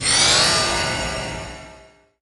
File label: shield